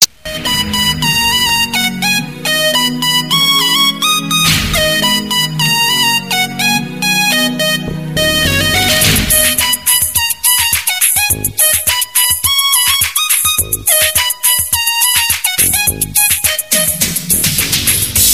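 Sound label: instrumental; Indian; Dheena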